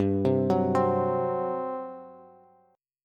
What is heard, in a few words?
G Major 7th Suspended 4th, Bass; GM7sus4 arpeggiated; JGuitar